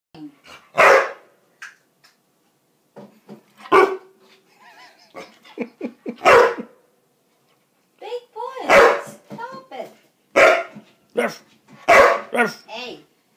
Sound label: akita, سگ اکیتا ژاپنی